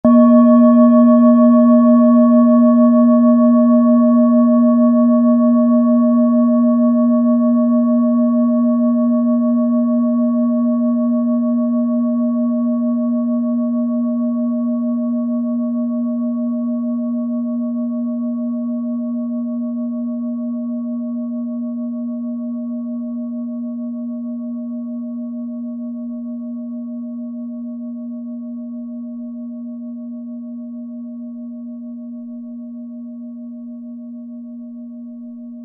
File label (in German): klangschale messing; klangschalen